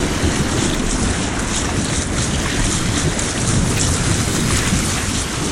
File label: lava loop; Quake 4 Alpha; id software